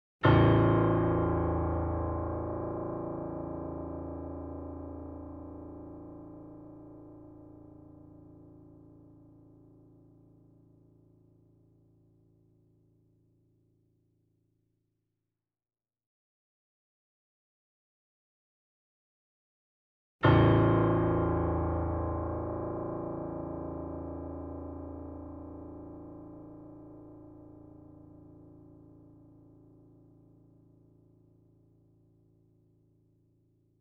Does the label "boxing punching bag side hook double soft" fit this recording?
No